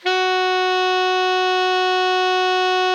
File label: alto sax F#3, saxophones; Greytsounds; Philip Wolfe David Crozier's Saxophones